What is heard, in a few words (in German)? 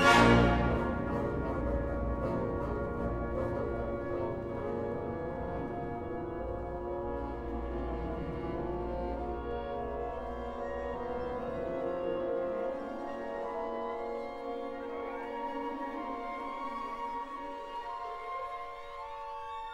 Eine Alpensinfonie (An Alpine Symphony) for orchestra; Nebel steigen auf; Strauss